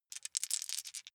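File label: small four rattle in hand short, dice; wuerfel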